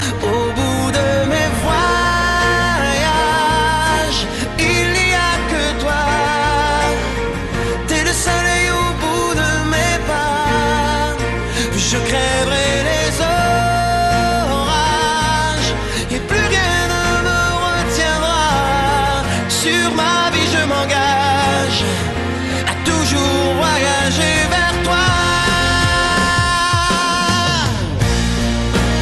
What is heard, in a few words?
Is Calling RNB